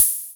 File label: Hats Electro Open